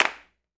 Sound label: CLAP R; Big Fish Audio; Ross Garfield Drums VOL-2; ST LOUIS KIT